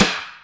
Rimshot

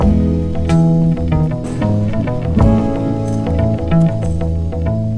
bass, Loops Phonky

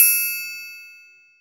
Triangle; Misc Percussion

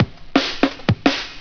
Drum loops, Funky

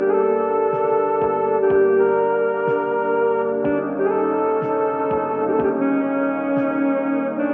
sax, Sad

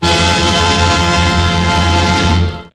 tada

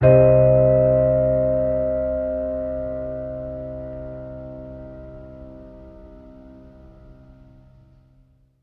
piano and keys; Notes

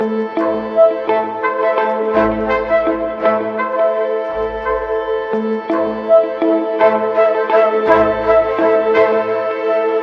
strings and horns, sad; loops

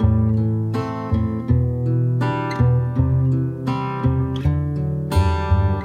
guitar, Sad; Loops

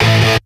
guitars

